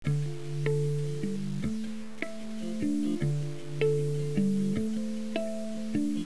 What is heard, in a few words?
loop; Music Toys; kbot